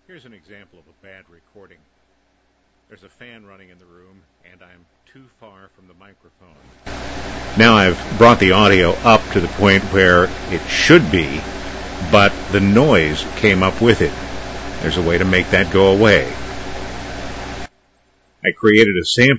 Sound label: Noisy Environment